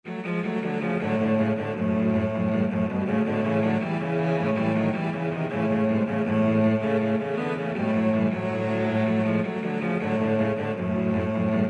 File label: mexican hat dance, cello trio